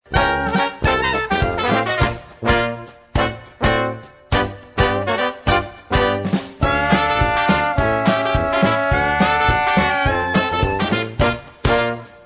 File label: Jazz Band, big band 20s; New Orleans Jazz Band